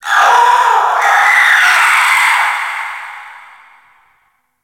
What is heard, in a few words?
Infested Crawler Dist Vocal; Warframe